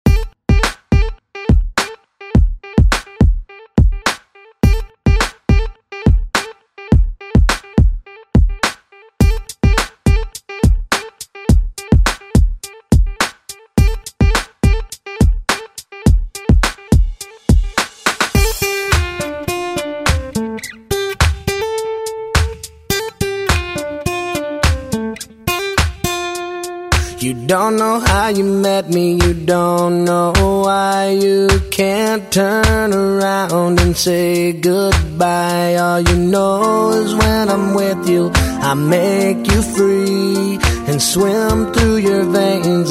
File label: Rock Redrum; Deejay Hit